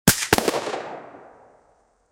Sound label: a single shot of an, firearms